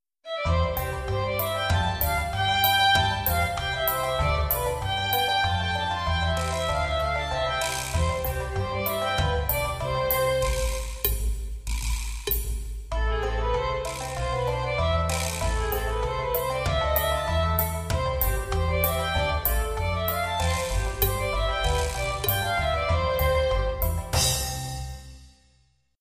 Virginia Reel

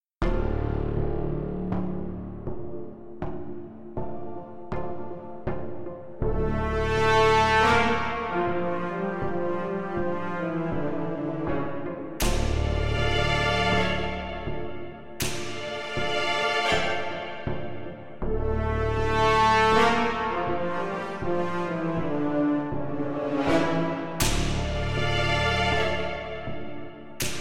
Electric Sinister

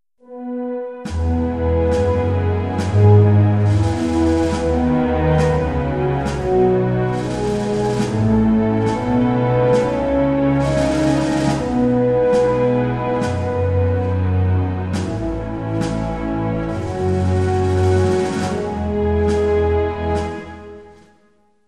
Patriotic Music

